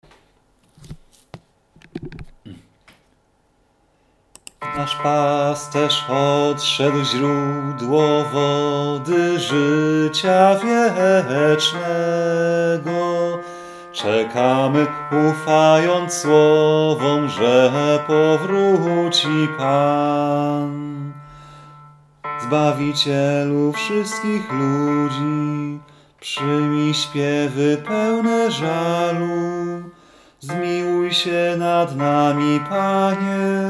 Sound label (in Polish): bas; Nasz Pasterz odszedl; piesni wielki post